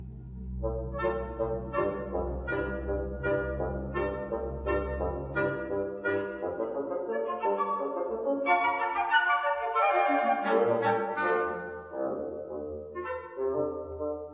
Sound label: Variations Bassoon